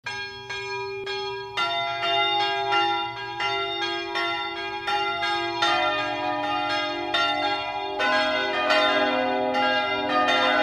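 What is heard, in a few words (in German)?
Glockenlauten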